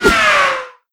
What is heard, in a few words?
dodge object; Ringling Bros. and Barnum & Bailey; Wii